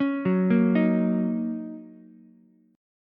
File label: D sharp Minor 7th, Ukulele; arpeggiated; JGuitar